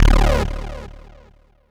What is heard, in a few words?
Synth Hit D